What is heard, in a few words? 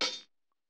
hihat, lofi hiphop; Legendary lofi hiphop